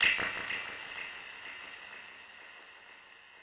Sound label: Open Hat